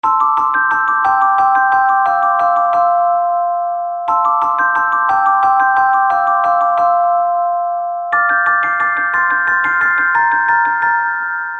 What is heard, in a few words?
Modular Light Ringtone, Celesta; HTC